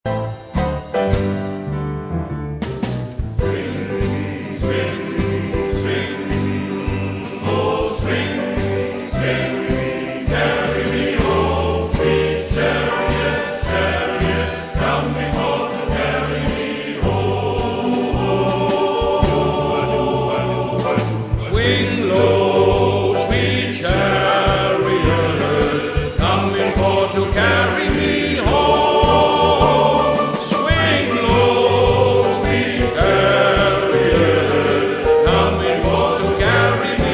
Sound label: Swing Low